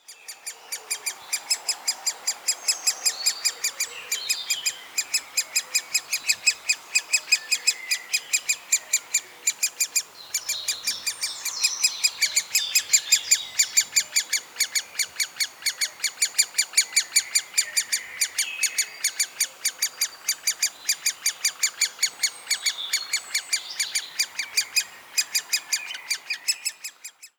grote bonte specht bedelroep

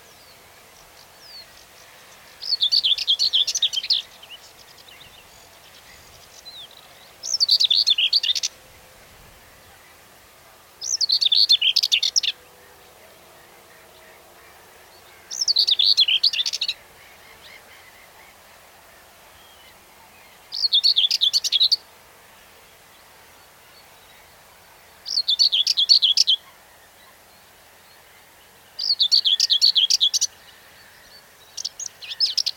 grasmus zang

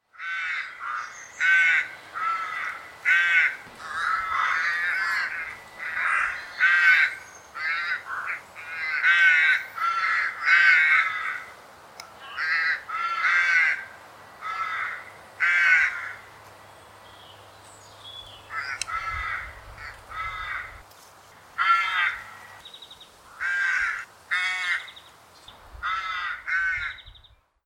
roek roep

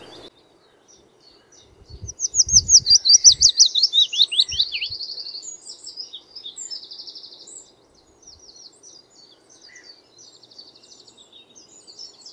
fitis zang

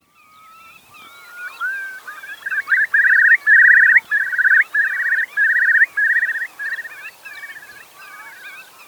wulp zang

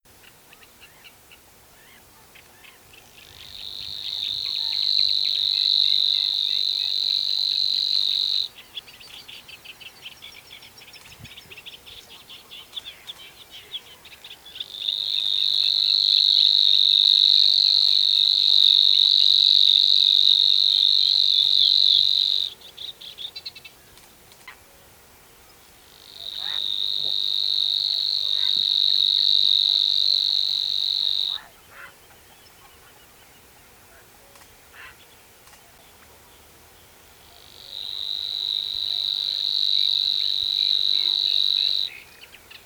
snor zang

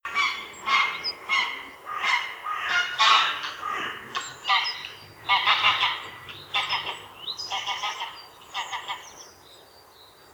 grauwe gans roep

